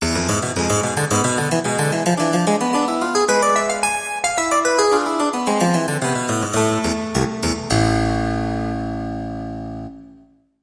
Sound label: Harpsichord Classical Fanfare